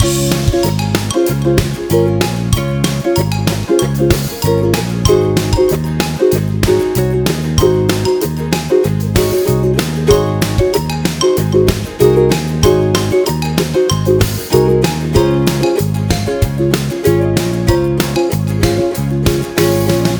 Ukulele loop; Corporate

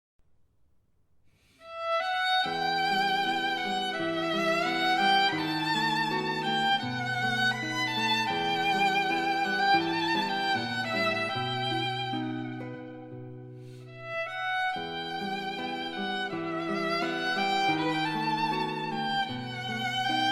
violin sonata; niccolo paganini; no 6

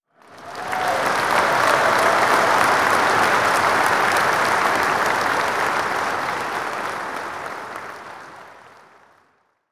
crowd clapping

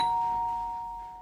dong

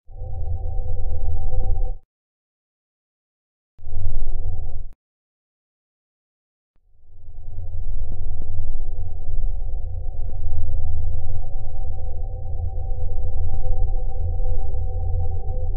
Megalodon Sounds

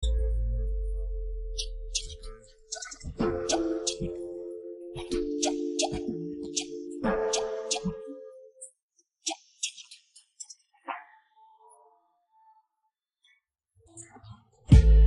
INSTRUMENTAL

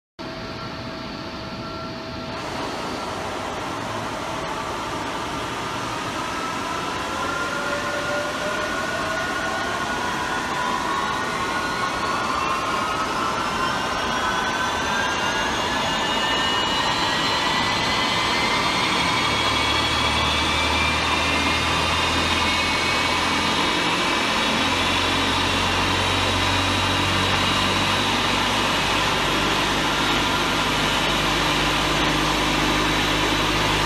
avion despegando airplane taking of

